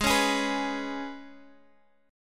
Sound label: Ab Minor Double Flat 5th, Guitar, Half Step Down